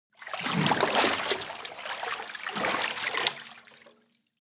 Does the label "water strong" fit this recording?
Yes